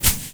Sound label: run grass